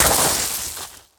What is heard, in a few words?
Bodyfall Gravel; SFX Kit; Bodyfalls